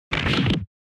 Fracture Dry; 骨折; ドライ

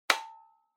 Portable Cassette Stop

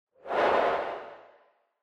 Camera Motion Mid High Reverb

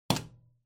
Light Switch Selector Button, Wall Hard

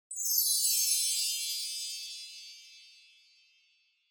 Wind Chimes, Reverb Fast Down High

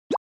Short Accent Dry; ショートアクセント; ドライ

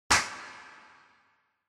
Balloon Pop Reverb

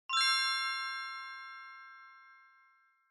Bell Accent, High Dry

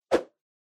Motion Swish; モーション・スイング

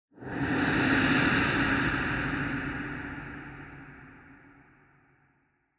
Scene Flashback Long

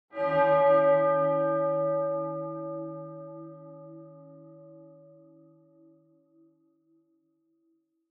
Church Bell, Far Low Short

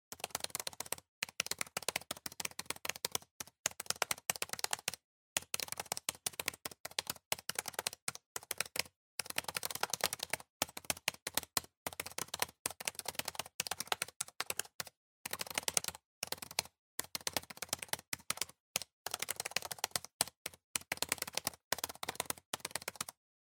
PC-Keyboard (Hard)

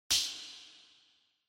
Hand Synth Clap, Reverb

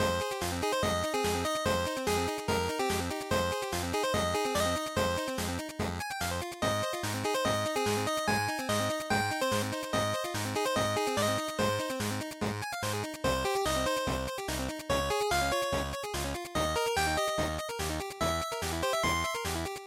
Battle Loop; GB RPG; bgm

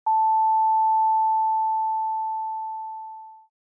Time Signal Beep Short

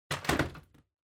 Vacuum Cleaner Put; 掃除機; 置く

